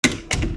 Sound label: door close; ドアを閉める